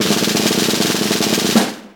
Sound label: SNARE ROLL; InVision Interactive; Lightware VOL-1